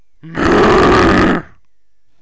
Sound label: bull attack; megaglest